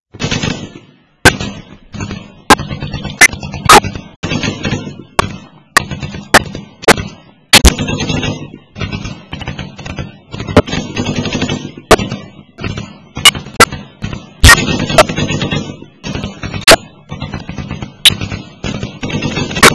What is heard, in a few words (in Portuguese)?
Vinhetas Metralhadora